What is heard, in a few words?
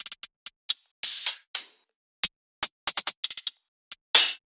One Shots Hats